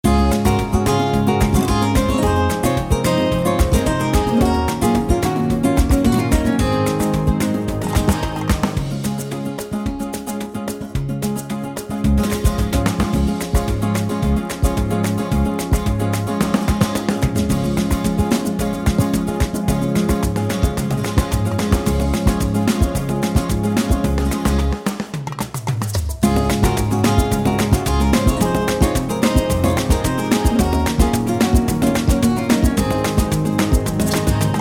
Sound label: Spanish rumba; wersi